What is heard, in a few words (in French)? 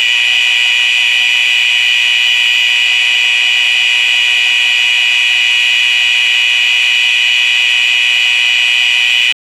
une alarme de dpassement, warning